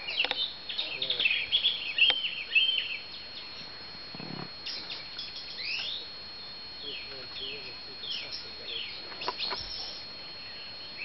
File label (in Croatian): slavuj nocu; Vugrovec